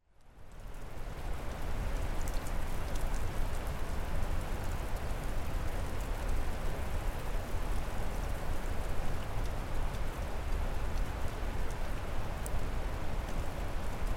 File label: ice falls